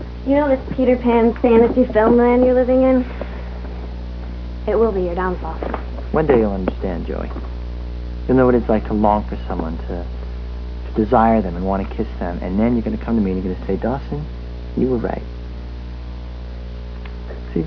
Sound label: clap hard